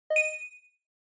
Inspiration Low; Onoma

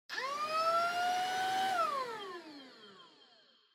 Vacuum Cleaner Vacuum Low Short; 掃除機; バキューム 低 短

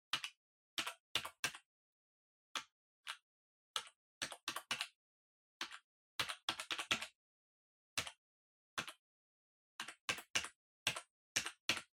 PC Keyboard Far Arrow

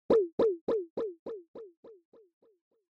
Onoma Sigh Delay Slow

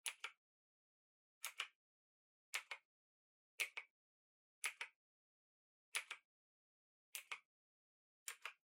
PC Keyboard Far Single Gentle

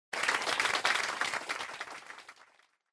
Applause Short

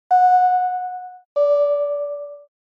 Doorbell Fake

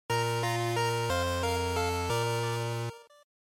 GB RPG Inn

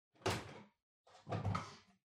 Washer Front Load Far Door Open

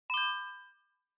Bell Accent Low Short

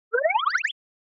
Motion Slide Fast

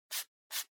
Trackpad Swipe

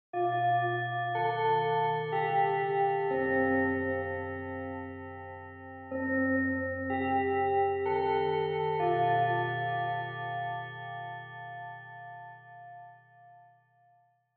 Japanese School Bell Far Slow Mid

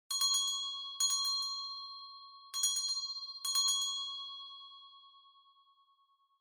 Winning Bell Gentle

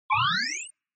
Scene Change Fast

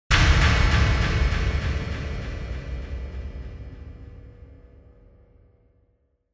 Onoma-Impact (Low-Delay)

